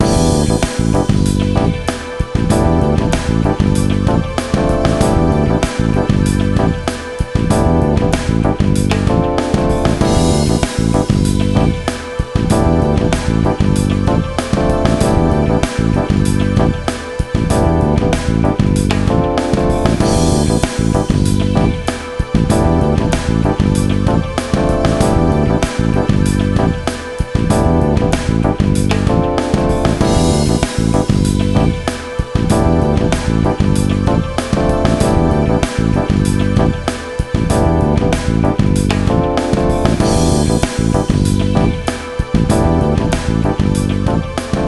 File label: Shop BGM; Airforce Delta Strike; ps2